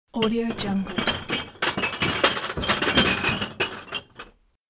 placing empty bottles in container

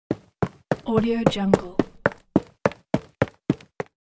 jog up stairs concrete

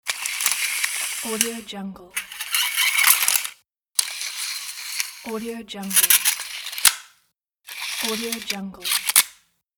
measuring tape

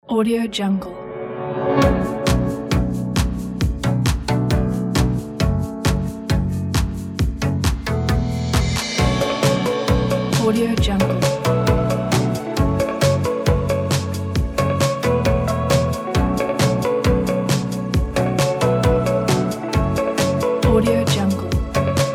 indie party; oggyproductions

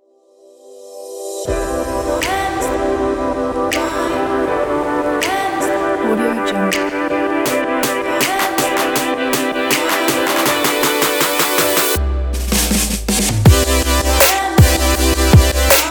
future bass; phoenix-sound

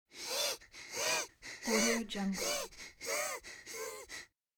wheezing strained breathing

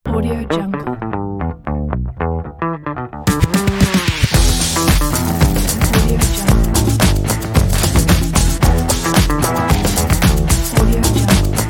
funky podcast show opener logo

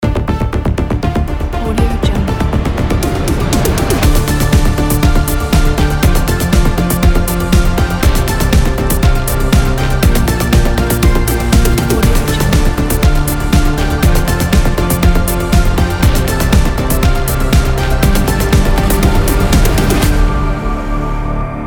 cyberpunk intro logo

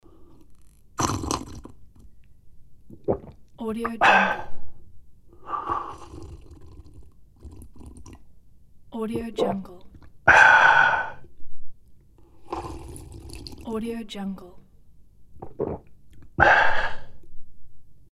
drinking coffee

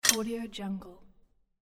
metal clink

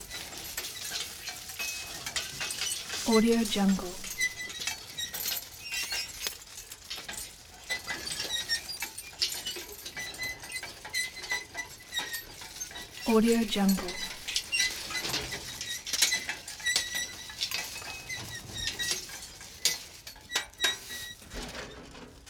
horse drawn implement no engine; collanderson

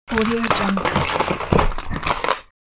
box of toys noise

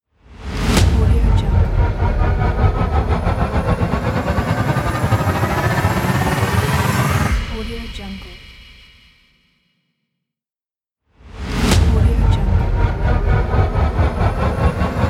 tension build up

